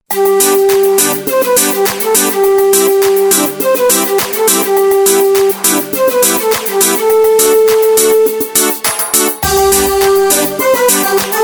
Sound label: Korg Pa3X